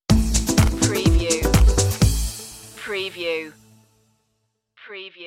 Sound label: shape shift stinger